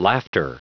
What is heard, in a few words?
laughter